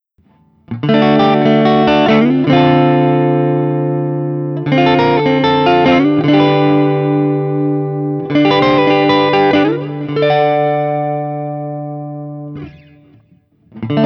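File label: Guild X92 Citron, Clean, D-Shape; Guild X92 Citron Breakaway from the depths of 1984; ODS100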